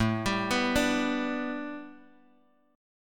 A 9th Suspended 4th Bass Standard, chord; A9sus4; arpeggiated; JGuitar

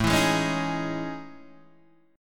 A Major Sharp 11th, Guitar Open D

